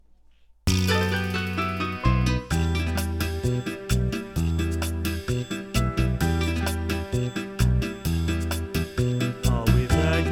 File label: backing track